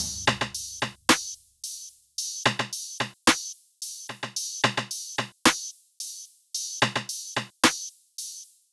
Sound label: Top Drum Loop 110 BPM; Drums; Top Loops; Humble Hip Hop Sample Pack